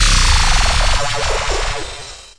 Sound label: Electro FX; Ueb HE Electro FX